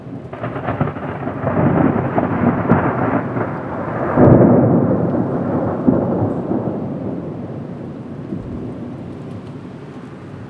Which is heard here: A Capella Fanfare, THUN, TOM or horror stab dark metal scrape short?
THUN